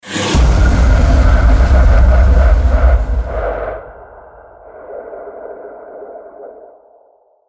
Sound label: aggressive hit logo